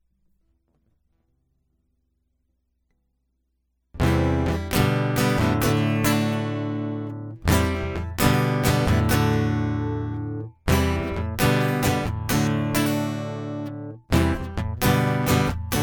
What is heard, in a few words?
Doggone Snowstorm Guitar Loop